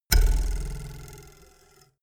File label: ruler twang